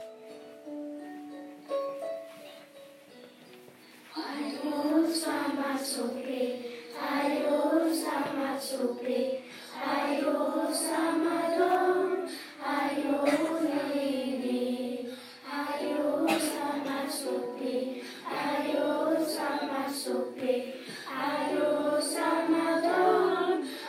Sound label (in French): Chorale Afrique